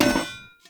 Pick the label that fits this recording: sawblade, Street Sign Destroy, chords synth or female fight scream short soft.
Street Sign Destroy